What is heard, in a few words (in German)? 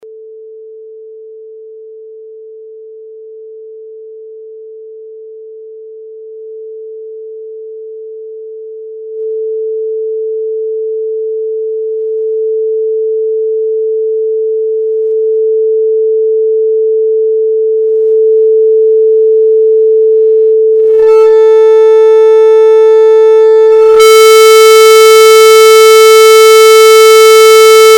M Track Duo Sinuston